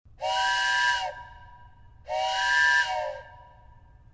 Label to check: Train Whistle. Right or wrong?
Right